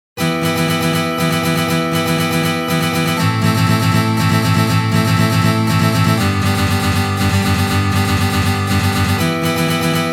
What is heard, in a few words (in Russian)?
CHechenskij boj na gitare